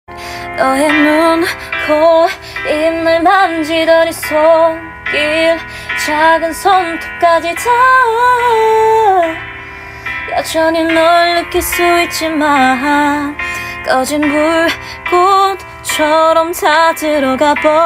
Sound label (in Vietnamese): Giong Nu Kpop